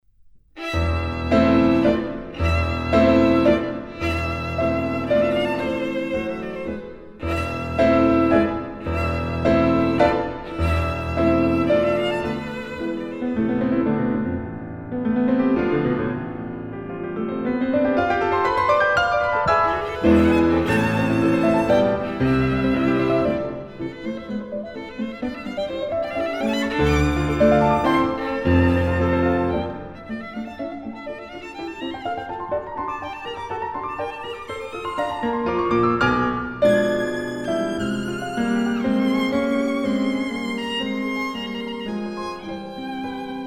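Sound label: violin sonata; jenner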